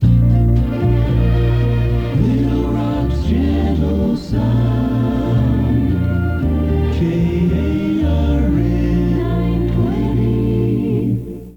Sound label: jingle; KARN; KARN AM 920 aircheck from nearly a half century ago